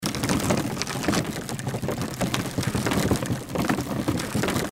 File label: reel spin; Lucky's Fish and Chips